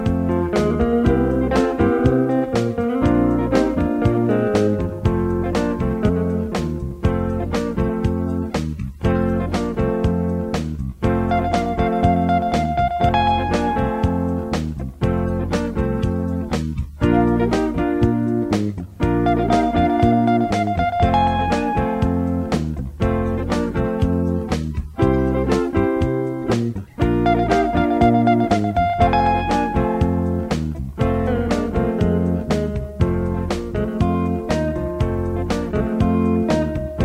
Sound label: guitar, harmonica